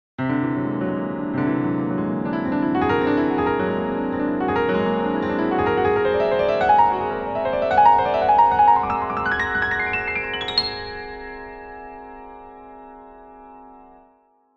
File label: Jingle